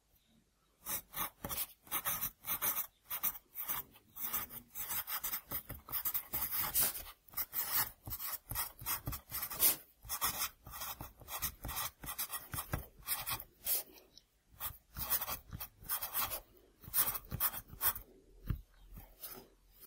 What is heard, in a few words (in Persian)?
صدای مداد